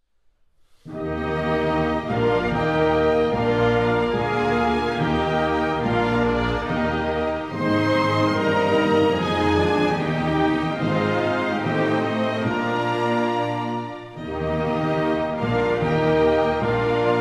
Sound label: hymne germany